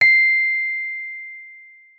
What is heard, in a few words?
MK1F C7, RHODES; Xsample VOL-1